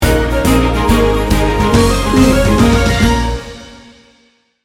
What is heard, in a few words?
triumphant warrior sting bumper